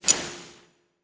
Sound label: UI Lightning Select; User Interface